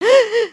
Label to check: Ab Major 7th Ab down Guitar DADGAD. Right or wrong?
Wrong